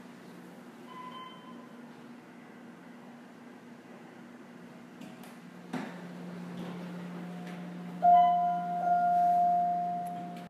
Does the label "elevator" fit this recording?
Yes